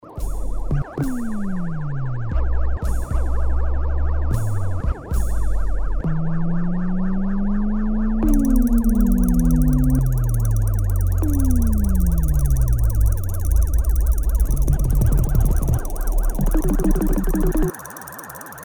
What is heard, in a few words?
drum; Emu XL1 Xtreme Lead